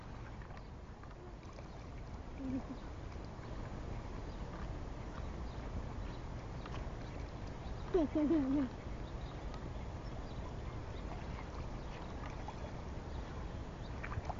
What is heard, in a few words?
duckfeeding